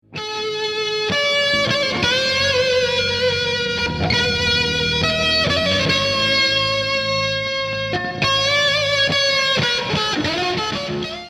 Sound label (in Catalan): himne guitarra; fcb